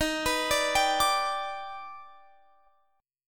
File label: D sharp Augmented Major 7th, Guitar; x,x,x,20,23,21,21,23